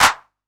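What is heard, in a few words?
808 clap; PERC TR; Wizoo - Platinum 24 Electronic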